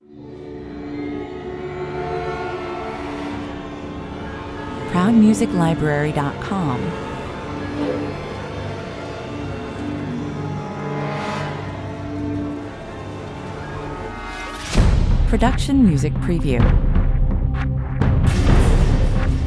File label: Driving action trailer with numerous bomastic hits and risers: fast, hard, direct.; Perfect for movie and gametrailers in Hollywood style, thri